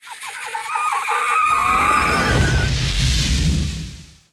Witch; SFX 3D Witch Spattack

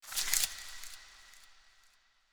tutorial close

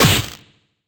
Punch; Fight Knight

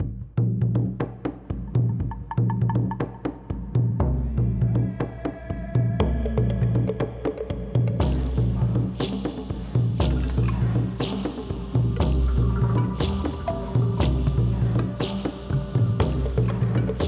Mysterious Jungle (Swamp); madagascar; ps2

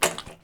Wet Footstep; Backrooms 2D

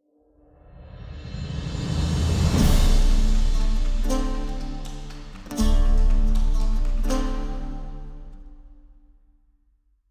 Race Outro; The Witcher 3 Wild Hunt

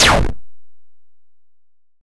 Beam; gloom 2017 gamerip

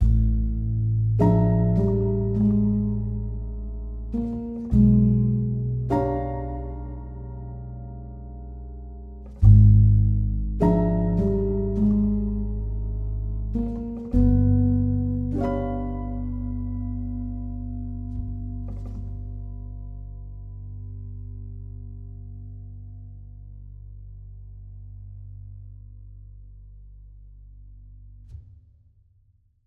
oneshot 102bpm; theme confrontation part02

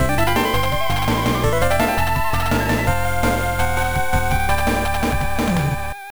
Mission Completed

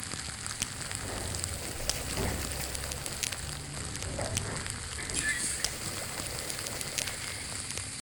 Ambience broken car

